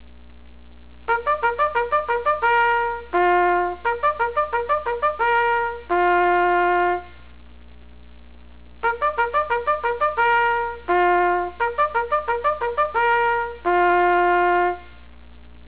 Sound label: first call, bugle sounds